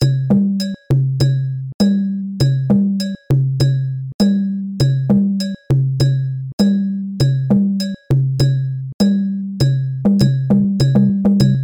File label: Saidi 100bpm, training loops; Ayoub